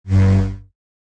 lightsaber whoosh

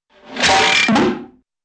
metallic ratchet and bee doop

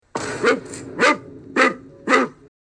barking like nuts; fova

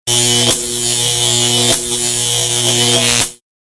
electricity

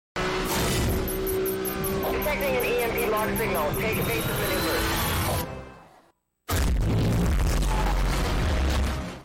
emp lock and hit; nfs hp 2010

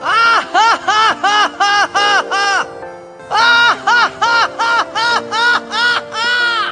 laugh; tidus; cringy

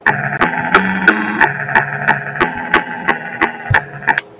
earthy guy humming

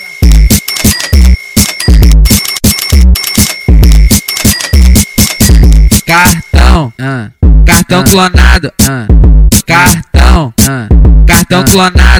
funk

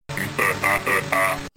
khakhakhakha

